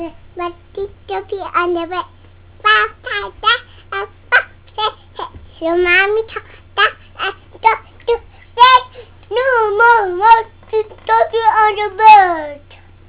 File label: five little monkeys